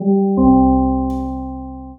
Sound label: signature